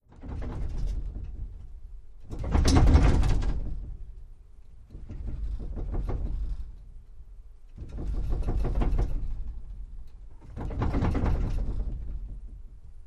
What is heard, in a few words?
Metal Vibrations: With Medium To Heavy Movement. Dual; hits & rattles